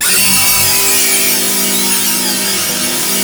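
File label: G4 orchestra; Northstar; The Wizard VOL-1; SCORPION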